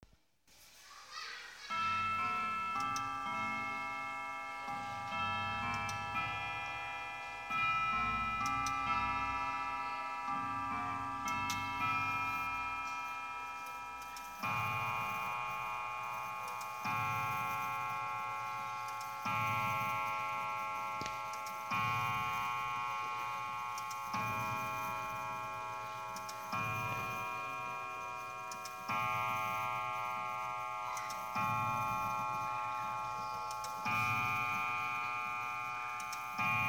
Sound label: clock chimes; sandell